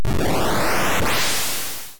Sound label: noise